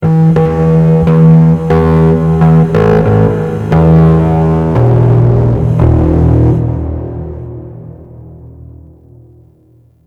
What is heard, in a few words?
Stage Bass Guitar; Warframe; Ambience; Nineteen Ninety Nine; Obj Temple Defense